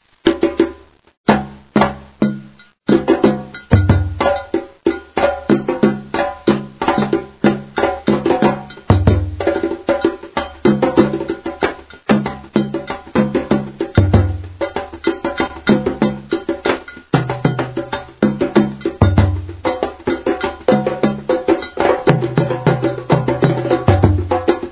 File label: tamtam